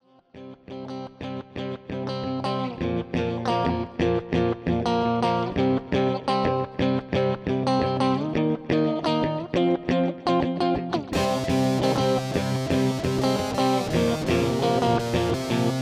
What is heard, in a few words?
Classic Jam Loop; RC-30 Loops